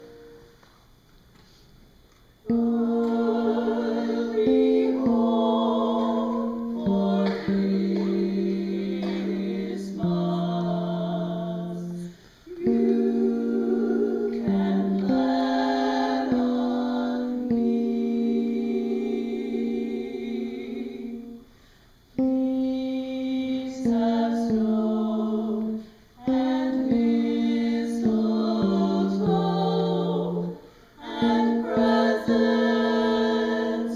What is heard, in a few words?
alto 2